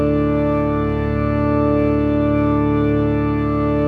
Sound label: Organ D Note; Ambience; Gameplay; Warframe; Orokin Moon